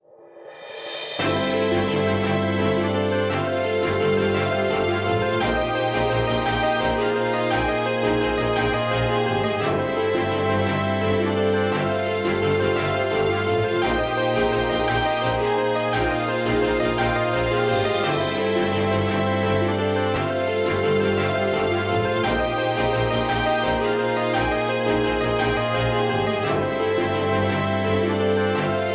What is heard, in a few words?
BGM EVE FLY HAPPY BUTTERFLY; Girls RPG Cinderellife; 3DS